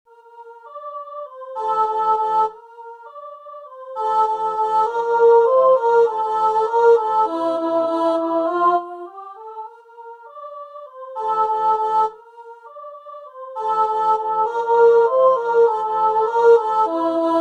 Alto Bottom

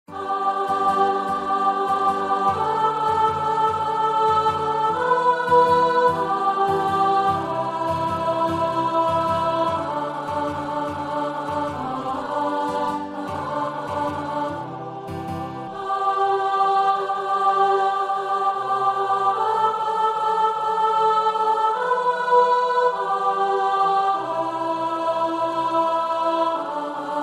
Alto

